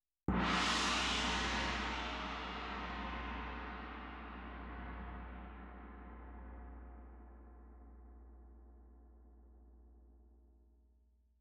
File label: Tam Tam Front